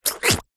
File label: cartoon squelch suck